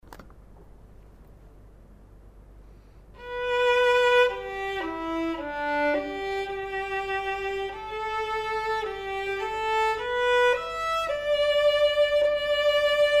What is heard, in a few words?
perf vln